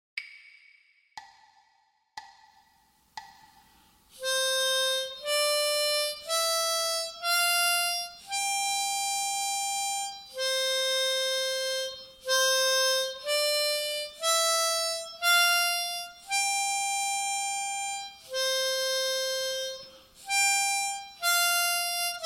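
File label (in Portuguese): gaita, exercícios de leitura à primeira vista; Gaita de Boca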